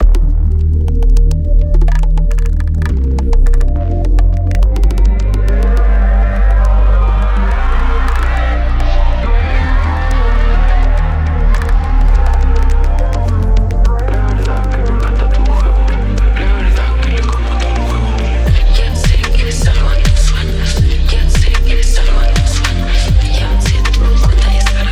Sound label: glitch